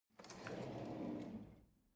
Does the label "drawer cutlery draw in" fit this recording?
Yes